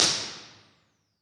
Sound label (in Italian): IR sweep palco sala vuota, Teatro Comunale di Ferrara; palco11; Ambassador